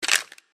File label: Enemy Arm; kritter krawler